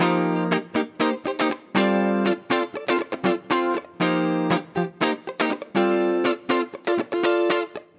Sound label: Guitar 120bpm Cm, Nu Disco; Zero-G; Construction Kits; Legacy